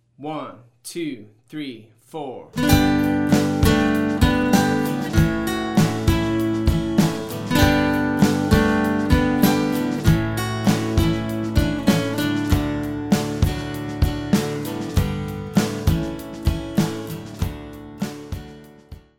Solo - Drums and Rhythm Guitar

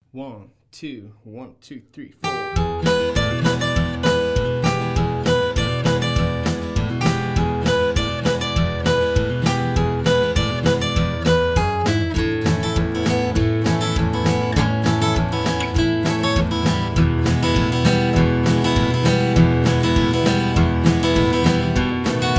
Solo

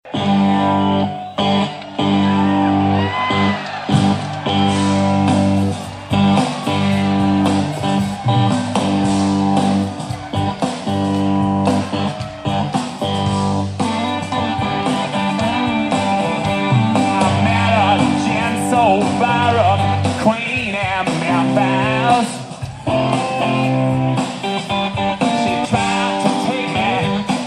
honky

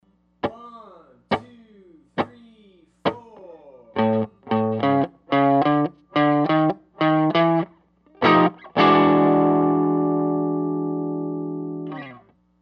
Blues Turnaround in A

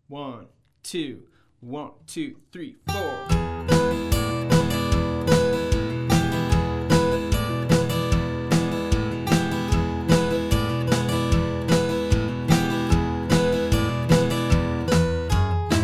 Solo Slow

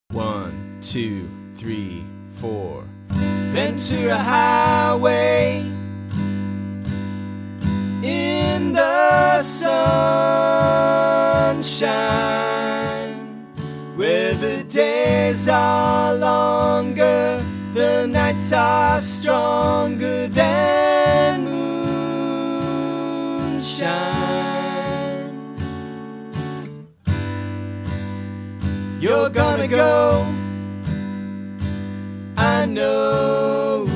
80bpm

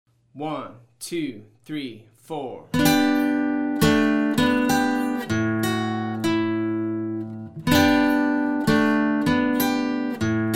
Solo - No Drums or Rhythm Guitar

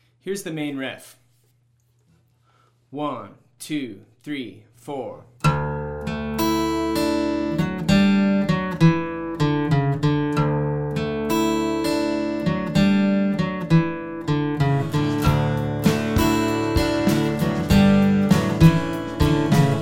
Main Riff

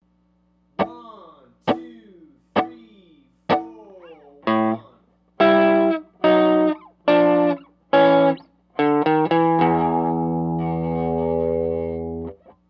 Blues Turnaround in A

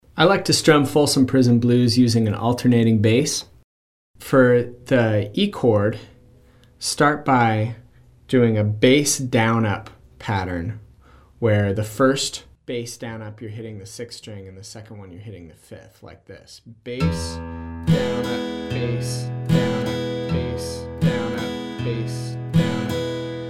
Strumming Breakdown

